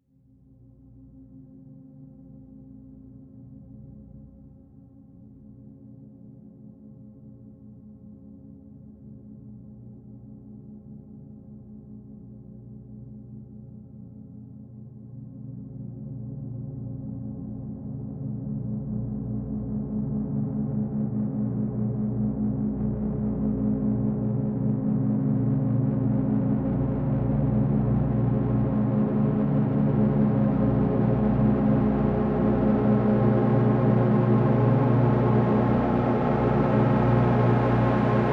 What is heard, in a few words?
ob6 sweep; lydmaskinen